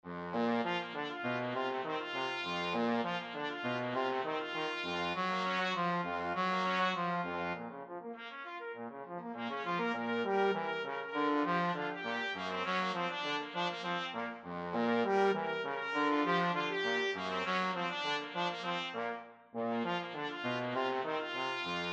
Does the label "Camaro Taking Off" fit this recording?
No